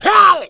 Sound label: strike smoker; umbrella